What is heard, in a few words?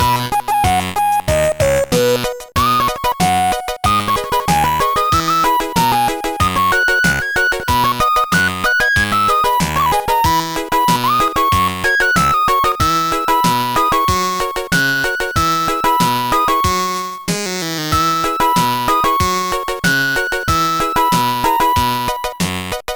the flute solo